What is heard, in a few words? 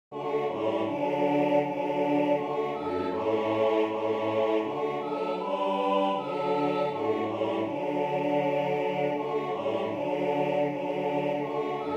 Come Thou Fount alto